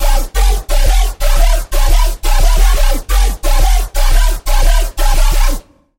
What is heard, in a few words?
Bass; Killing Machine; Routed Sound; Serum 2 Expansion - Chaos Bringer Vol.1